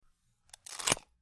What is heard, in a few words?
Bite Carrot Juicy; punches kicks hits bones